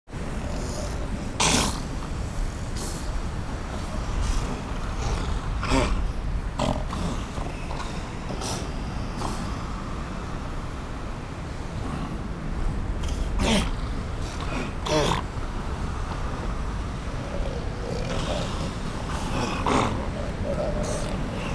Wild World of Animals Sound Effects

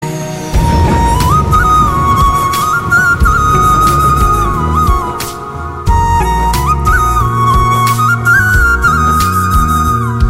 Flute Ringtone

